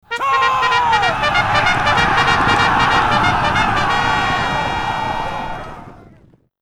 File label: Cav Charge